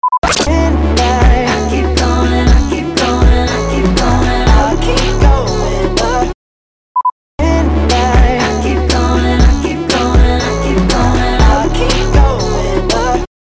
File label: 120BPM F#m